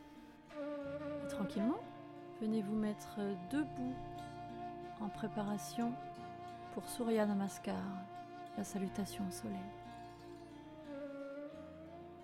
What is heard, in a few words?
Transition